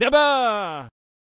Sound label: Flag Bearer Attack Vocals